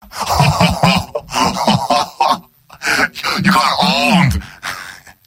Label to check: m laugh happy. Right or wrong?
Right